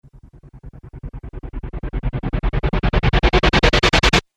Riser Abrupt Stop Chorus; Rise And Dive

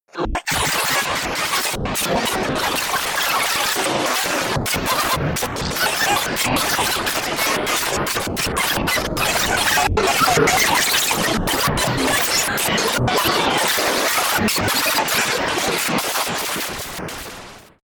Alien Radio Signal Tuning Transmission; Intercepted Poor Connection Digital Noise Radio Transmission; Communication

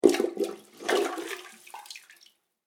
Household Toilet Plunger, bathroom

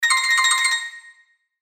Musical Twinkle; Musical Twinkle Trill; Miscellaneous Musical; Blastwave

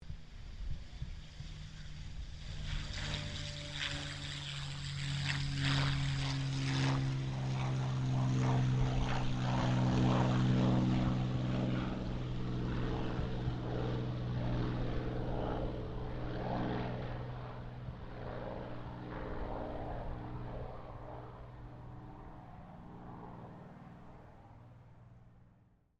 AIRCRAFT CA-18 MUSTANG TAKEOFF DISTANT; P51 /CAC-18 Mustang; Airplanes; Vehicles; Aircraft Collection; Twistedtracks

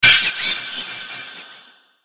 Sweeper Hit To Flange; Production Element Imaging Element Accent Transition; Sweeper Sound Effects

